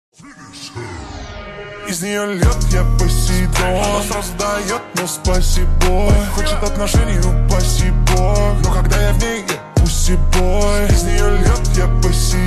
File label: slowed reverb